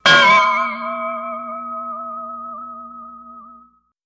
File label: Ding, metallic hit with long ring - off; chimes & bells